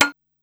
Thonk, Korg Wavestation-SR Drum Kit; Wave 455 - Drum Kit

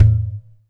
Perc, Alesis DM5

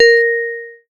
BELL-GONG, A#; Big Fish Audio

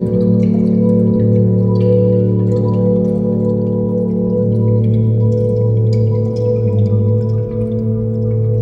ambience

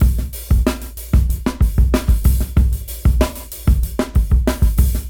Freeform 94 BPM; AMG - Keith LeBlanc Phat Butt Jams

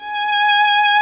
VIOLINS G#4, LEGATO VLNS; Instruments & Percussions; InVision Interactive; Lightware VOL-1

